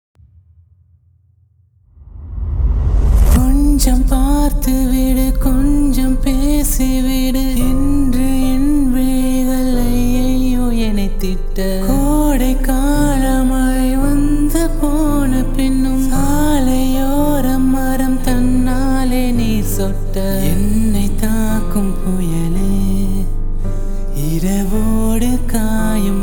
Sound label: Unplugged Cover